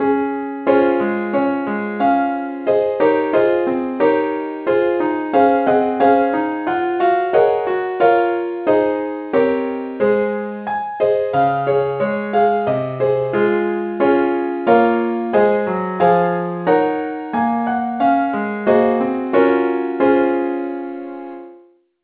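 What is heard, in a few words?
keyboard only key C sharp; saintthomas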